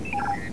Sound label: Computer; Star Trek; Rescue! 1.6